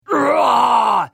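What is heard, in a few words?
Hit, Khajiit female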